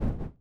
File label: step swamp